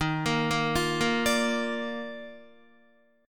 D sharp Major 7th Suspended 2nd D sharp Guitar, chord; arpeggiated; 10,13,10,10,10,10; 4F,4A,5C,5G,5C,6E; JGuitar